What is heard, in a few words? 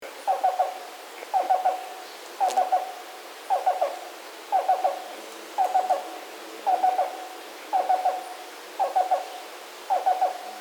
Black-billed Cuckoo, Coccyzus erythropthalmus